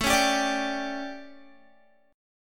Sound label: A 11th, Guitar, chord; 17,16,17,19,19,x; 4E,4A,5D,5G,5C,6F; strummed